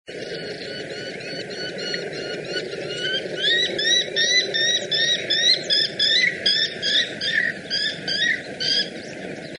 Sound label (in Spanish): pirincho